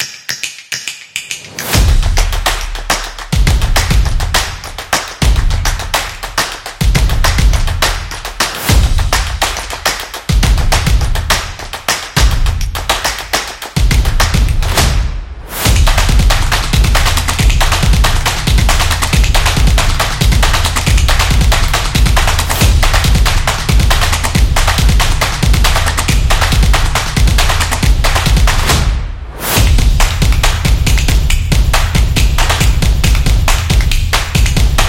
A Drum Solo; percussive nation vol 8

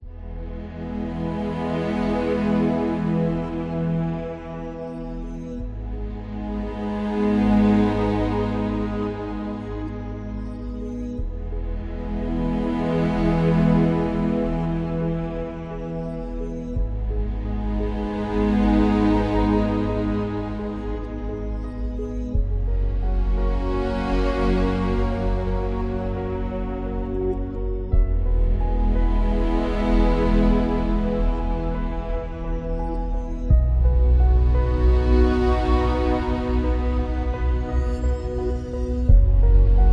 Documentary Cinematic

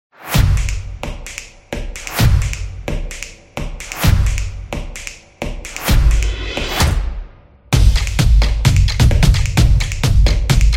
Energy Promo

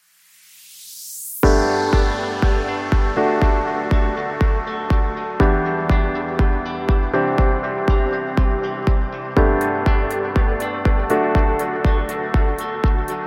Luxury Lounge Music; healthcare

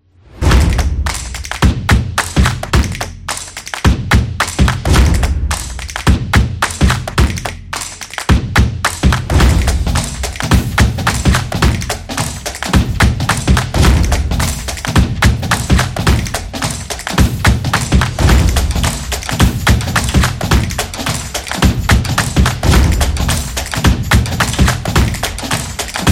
Action Commercial; football promo

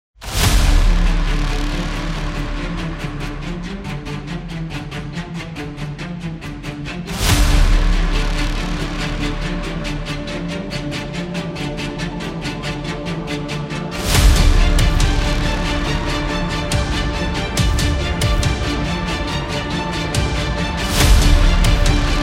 Trailer Epic; epic action

